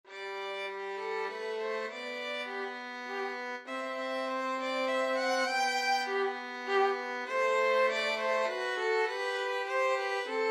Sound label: bach minuet, violin duet